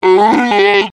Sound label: monoculus stunned; npc